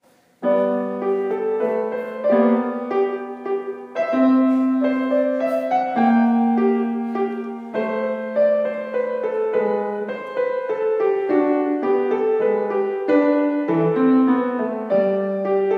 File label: minuet bach